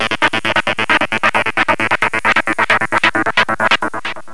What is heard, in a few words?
noise phase; techno; riffs; Terra Sound Library